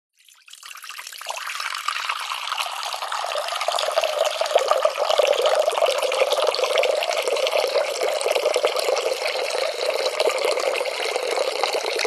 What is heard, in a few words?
household water pour slow into large glass bowl ring resonate